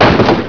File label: Crash; Chase Hollywood Stunt Driver; XBOX